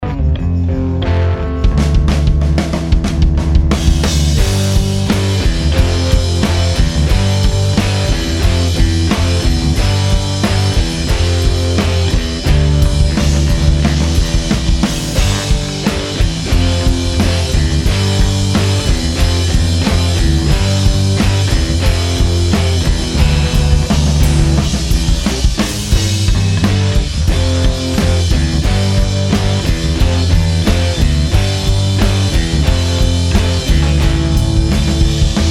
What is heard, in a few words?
Bass